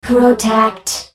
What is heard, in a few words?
spell cast effort; Oriana